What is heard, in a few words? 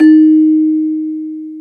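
CELESTA D#4, percussion; AKAI S-Series CD-ROM Sound Library VOL-8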